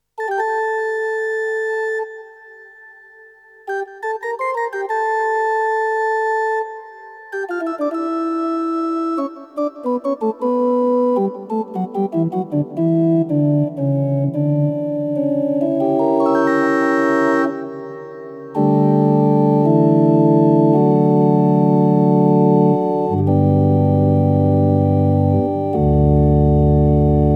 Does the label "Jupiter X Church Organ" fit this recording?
Yes